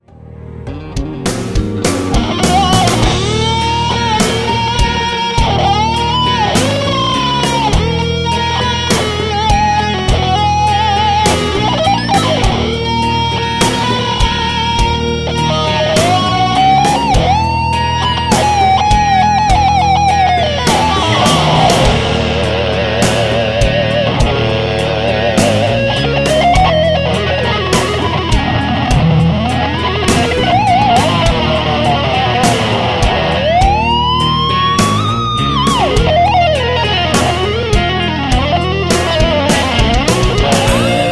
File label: Outro Jam